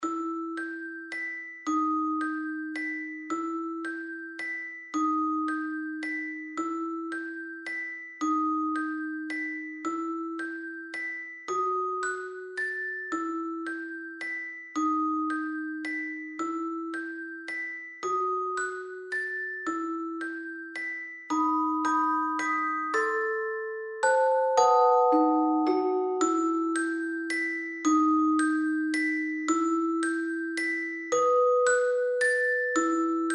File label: Celesta Accompaniment